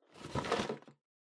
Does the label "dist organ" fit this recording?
No